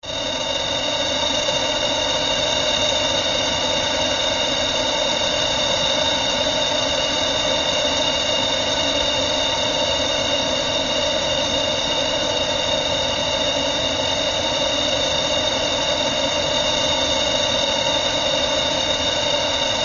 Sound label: science fiction space static weird